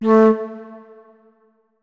flute notes; Hideout Furniture

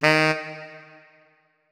notes, saxophone; Hideout Furniture

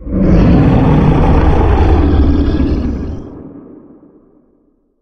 giant underground, monsters; Soundscape Overhaul

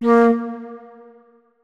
flute notes; Hideout Furniture

